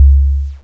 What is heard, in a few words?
game over